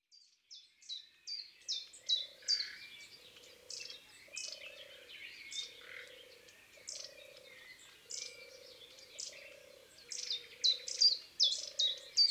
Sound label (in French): Mesange a longue queue, Chants d oiseaux des bois et des forets